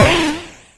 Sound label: SFX; Narco Police